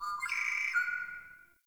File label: Cave Bird; Ambience; Caves; Glades Of Andurin